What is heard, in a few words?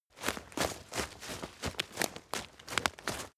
step leaves wet run; schritte